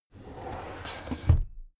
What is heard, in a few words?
drawer cabinet crammed close regular